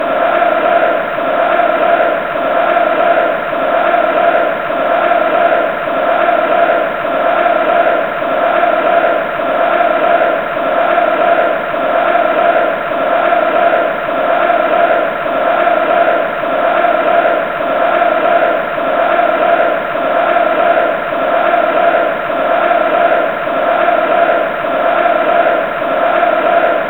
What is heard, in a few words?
crowd